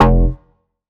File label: Bass F, Bass Synth